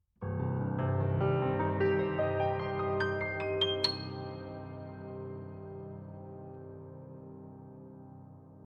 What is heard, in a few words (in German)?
ab hoch tief